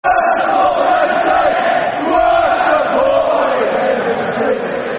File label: Football Chants (Soccer Songs); Worst Support We've Ever Seen; Reading Rfc